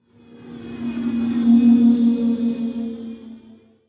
amb tfly by; Star Wars DroidWorks

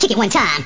kick it

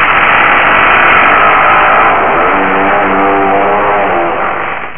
coffin; Theldrow

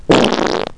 Good One, Prout & Burp

